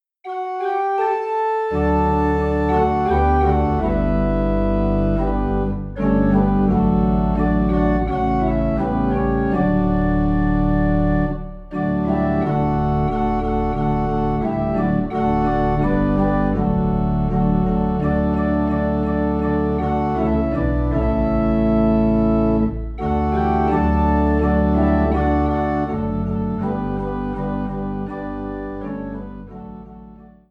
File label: Organ Low in D